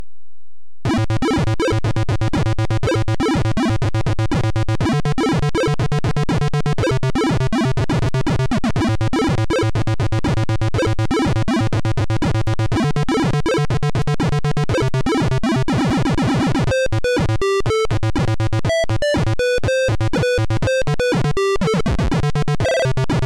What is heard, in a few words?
music pcspeaker